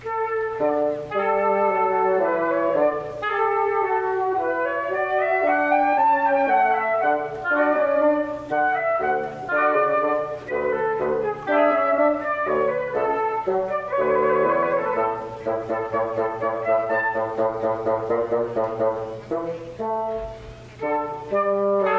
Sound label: Chamber Music